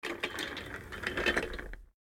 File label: Computer Chair; MiSide